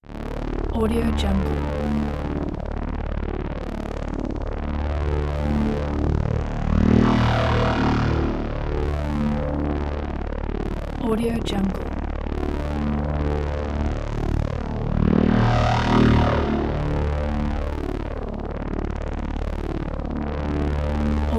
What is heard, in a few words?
scifi drone